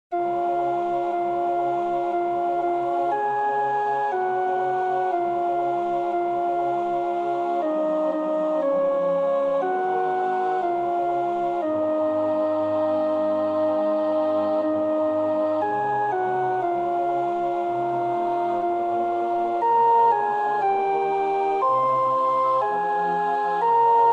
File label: The Lord's Prayer (Sopraan), Oefenbestanden